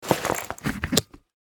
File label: School bag backpack buckle clip close; luggage